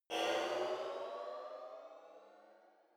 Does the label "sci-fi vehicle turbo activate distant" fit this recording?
Yes